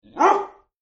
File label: Dog Barks; Dogs; Sound Effects:Animals